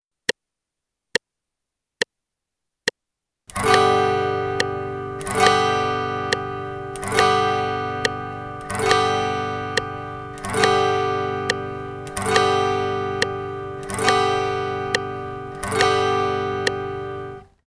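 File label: strum quarter notes pattern 2 G, guitar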